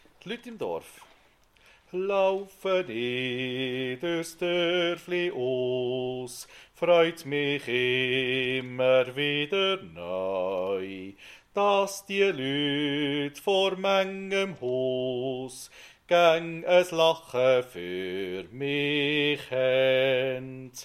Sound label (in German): Bass